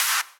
Clap

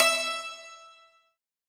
Santoor E4

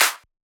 Clap

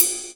Ride

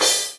cymbal

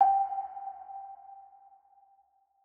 Bell

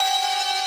synth main

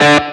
staccato; osu

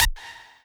lead

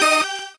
normal hit normal

